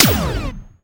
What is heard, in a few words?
pulse; weapons